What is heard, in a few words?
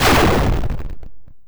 cannon, weapons